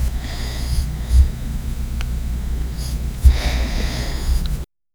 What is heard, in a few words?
bed creaking soft breathi